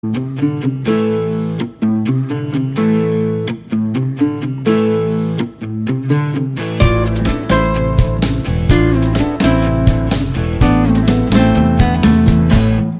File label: my guitar